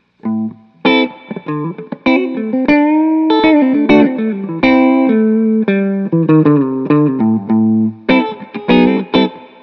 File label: Clean; FUZ-1